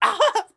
Death Hit

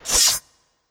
Impaler Earth Ready

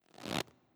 Amazon Attack Prep

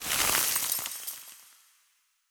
Ice

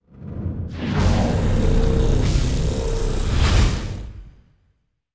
Drain

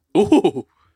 Yelp

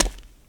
Foot Step

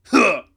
Grunt; Nailmaster

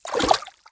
swimming splash; MiniGames

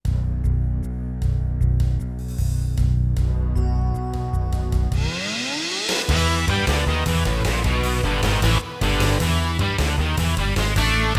Ending

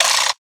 Twist; bop it the video game android ios macos switch windows gamerip 2025

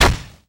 Toaster; Rabbids Multiverse

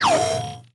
new Menu Cancel

